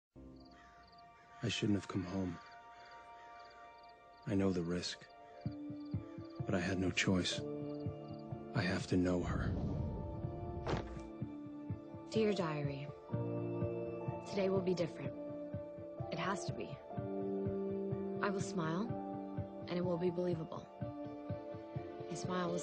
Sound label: Wicked Vampire Intro